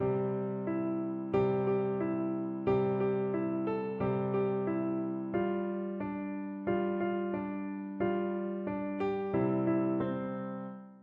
rain rain piano

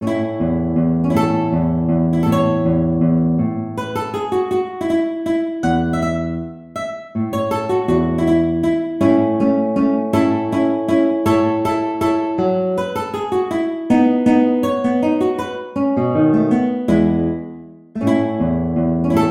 Waltz, guitar; Strauss

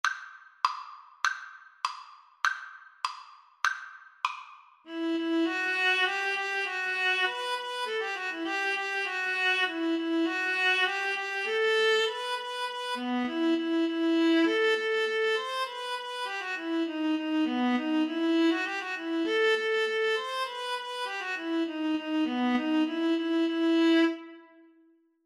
viola duet

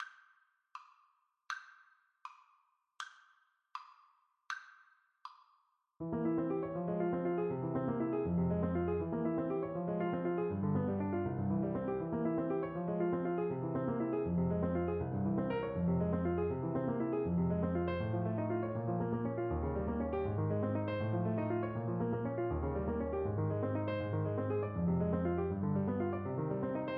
chason, Trumpet; smetana

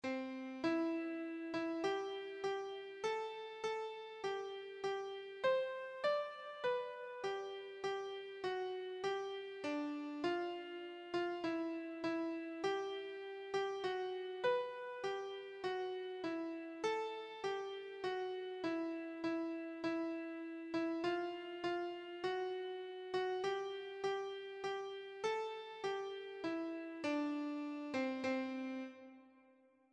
Navy Hymn keyboard

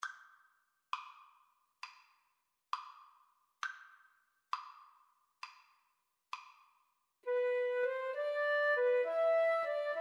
pastorale, Flute Cello Duet

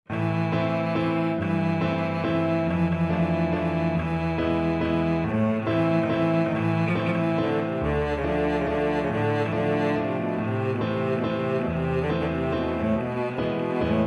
kujawiak, cello

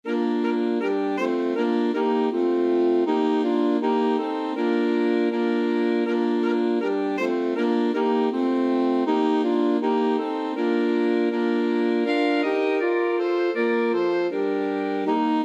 alto sax quartet

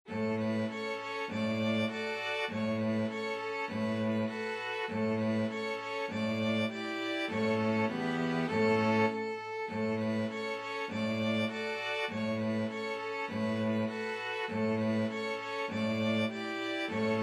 string quartet

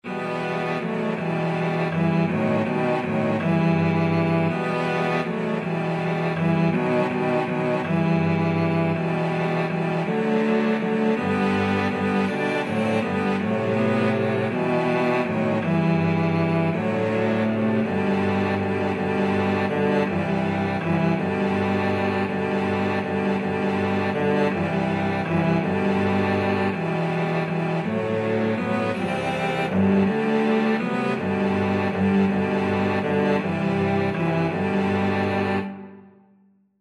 cello quartet

